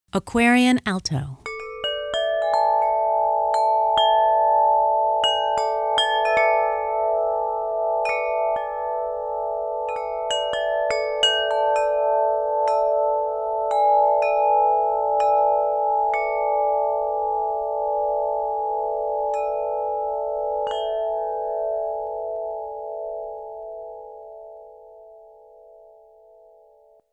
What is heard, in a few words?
Aquarian Alto